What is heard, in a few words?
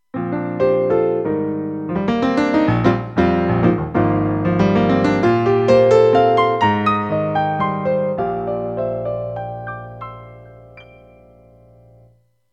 Piano